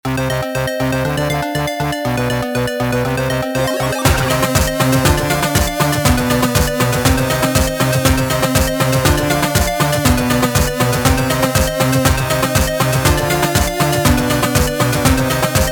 short 8 bit background music for video mobile game old school